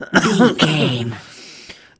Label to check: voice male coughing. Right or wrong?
Right